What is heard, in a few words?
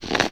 page close